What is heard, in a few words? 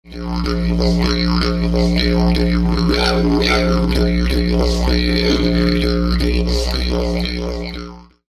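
didgeridoo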